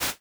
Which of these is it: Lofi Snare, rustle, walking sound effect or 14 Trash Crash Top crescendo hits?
rustle